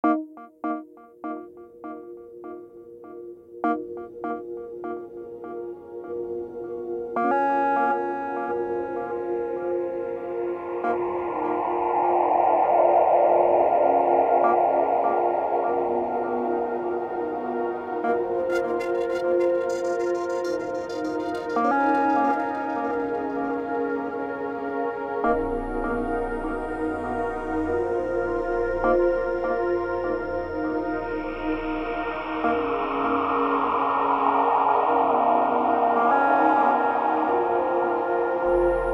Space Music; creeper world 3 arc eternal

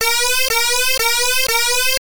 Warning

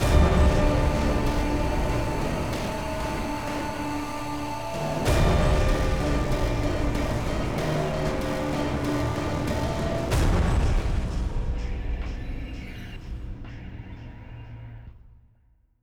Outro 95bpm; battlefield hardline; ps3 ps4 windows xbox 360 xbox one

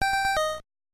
Item & Notification; nes; the adventures of rad gravity

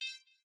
Blinking Appear; doodle jump 2; android

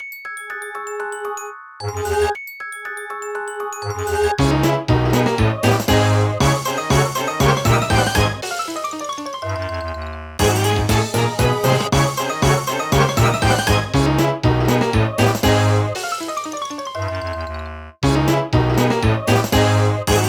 Jingles; Ashley

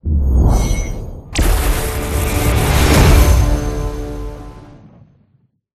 Fuse; Spider-Man Unlimited; Android

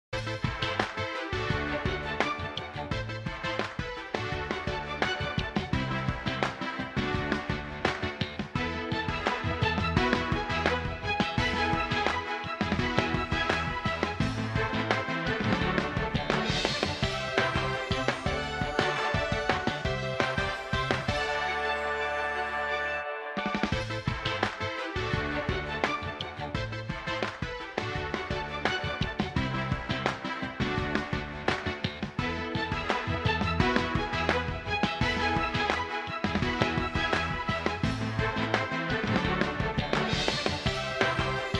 Radio Tune

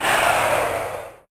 Crowd

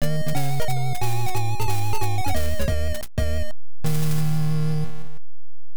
I'm Down (Game Over)

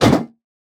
Jump Metal Land

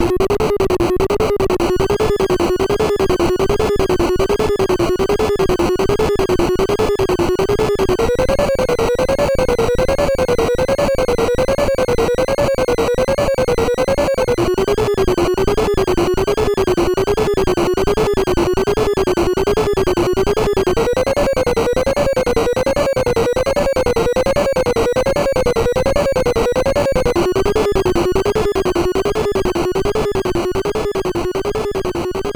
Low Ammo; Amagon; NES

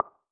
tile; Gonner

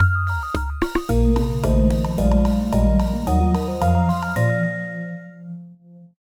Jingle Room Unlocked

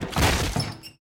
Tactics Break Door; This Is the Police 2

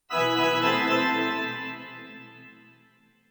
Play Organ; RuneScape 3

